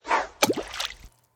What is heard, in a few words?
gambling rod put; Hungry Shark; Wazdan